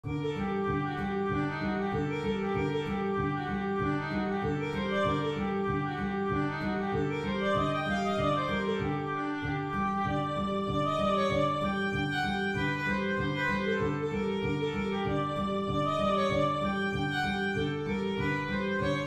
nahawand full

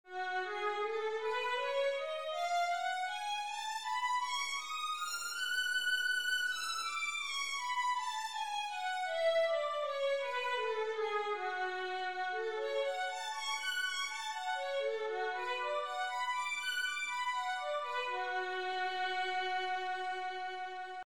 violin F sharp minor, scales 2 octave

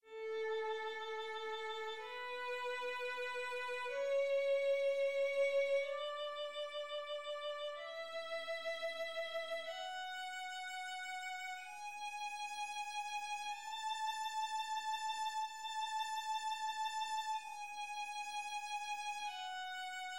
amjscl wholenotes, violin

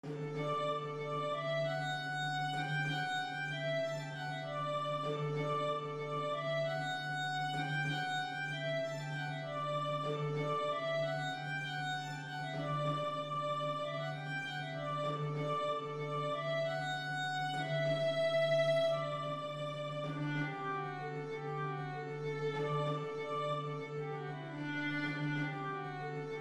sara full

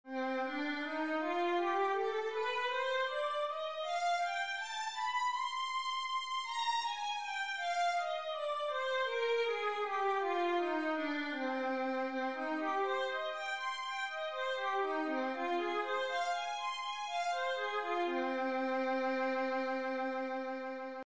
violin C minor, scales 2 octave

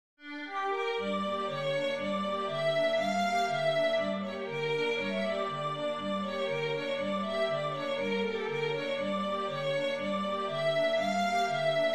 bulgarish full

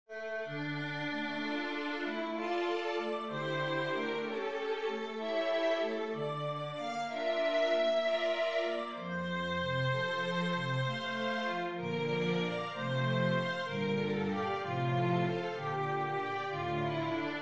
elegie full

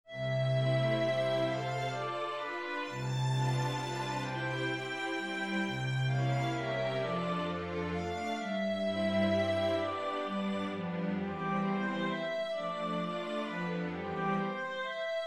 concerto e minor full

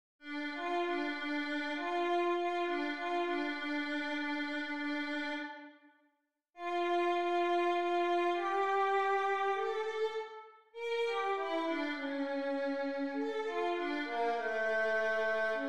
etude, violin

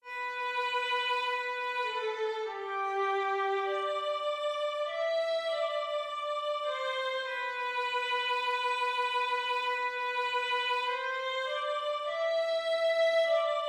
abide vln

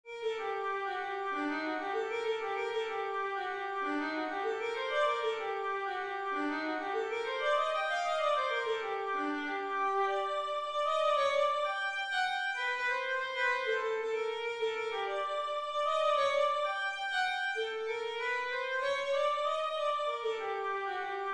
nahawand violin

